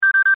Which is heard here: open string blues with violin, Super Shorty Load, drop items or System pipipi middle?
System pipipi middle